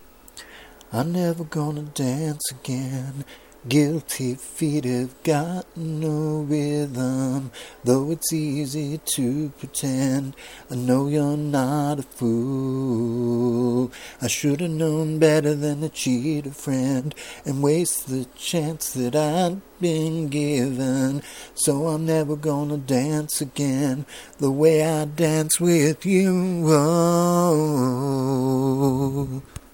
chorus

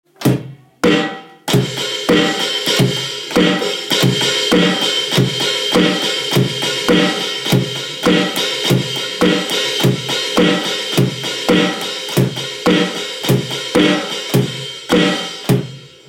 drum kit piece

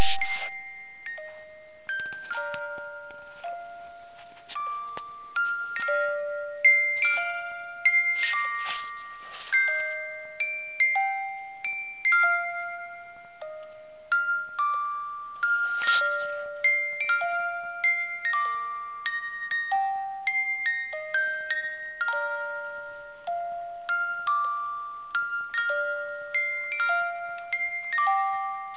fisher price music box ferris wheel

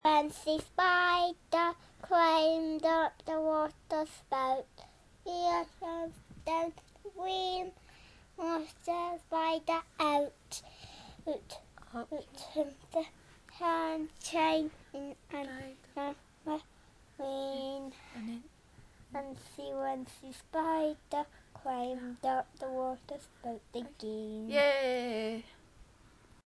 spider song

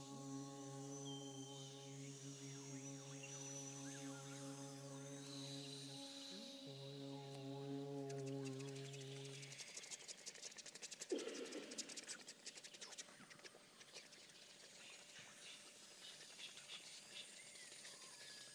feral choir in action; curioussounds

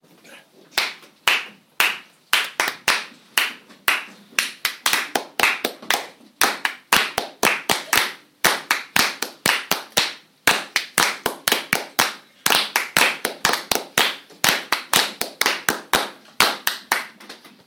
rhythm grid

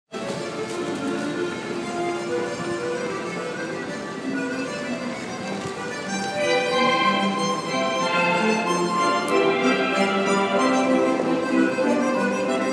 harmonica; st paul's

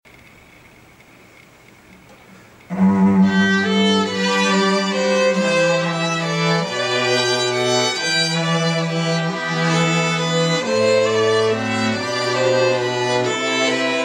cello

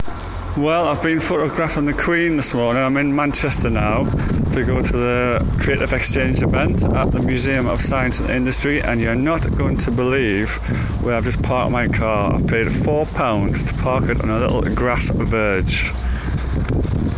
car park; manchester

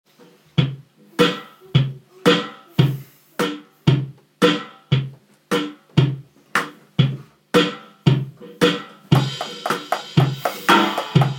live drum kit recording

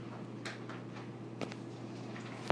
weld on panel test; students

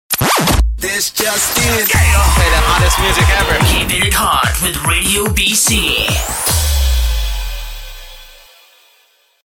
jingle; keeping it hot radiobc

